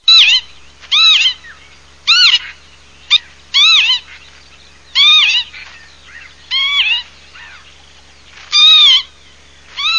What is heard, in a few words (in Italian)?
pavoncella